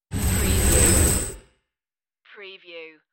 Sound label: sliding pneumatic door